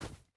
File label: footstep rubber boots snow run; Rust